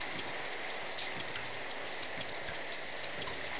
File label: RAIN